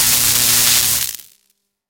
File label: electrical zap sizzle